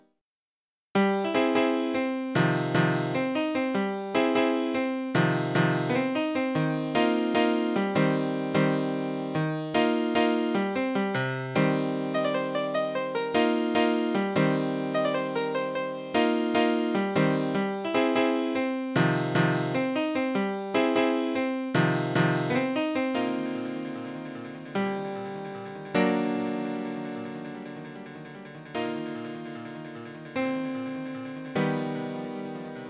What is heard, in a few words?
piano